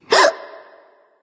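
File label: nonverbal surprise; smite; ratatoskr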